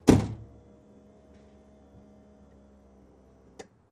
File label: Buick Park Ave 87 Trun; buick park avenue 1987